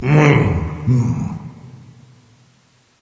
nv confused; Smite; awilix

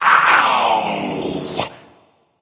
ouch; smite; agni

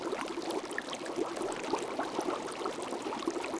flametrail loop; Smite; Sol

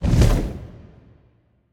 wing; smite; npc; grkconqs8 titan chaos; s8chaostitan

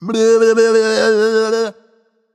tongue; smite; voicepack; khepri

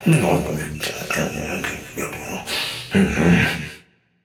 grumble, voicepack; smite; jorm

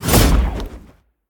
projectile; smite; olorun

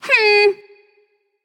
tongue, voicepack; smite; pele

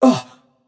surprised; smite; xbalanque

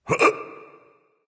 surprise; smite; Vulcan

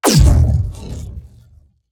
throw; smite; atlas

